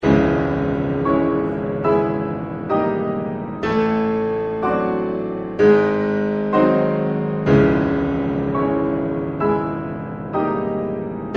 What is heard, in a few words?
rachmaninov prelude in c sharp minor